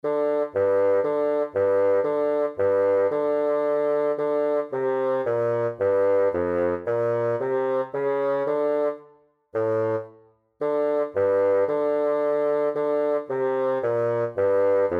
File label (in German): Czardas Fagott